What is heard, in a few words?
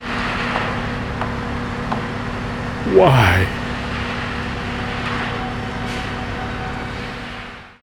sawmill